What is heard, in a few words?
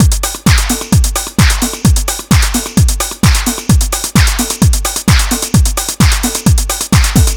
House; Best Service ProSamples vol.10 - House; AKAI